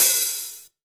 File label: HH, Drum Mashines; EdgeSounds